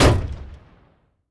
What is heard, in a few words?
Hit In, Damage; DCS